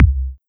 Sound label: Kick Club